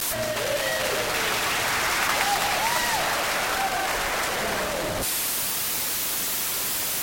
applause tone

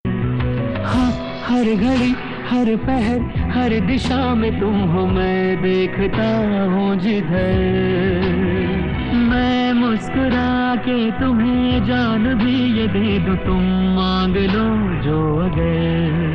Flute

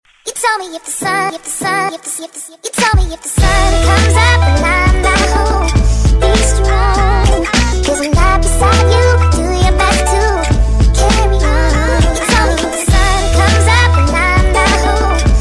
Best Hiphop

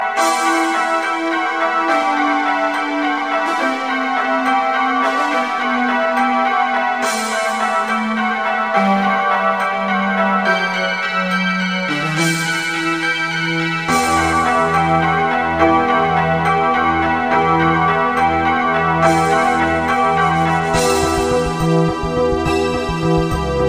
Christmas Ringtone

